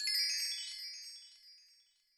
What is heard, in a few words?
ding a ling a ling